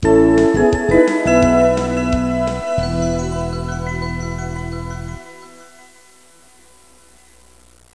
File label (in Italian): musica newage